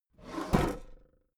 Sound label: desk drawer open heavy